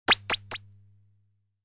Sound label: Boss Fires a Bullet